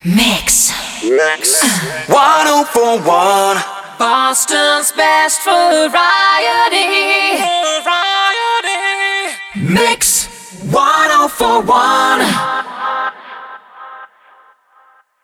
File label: 125bpm Ab; WBMX 2017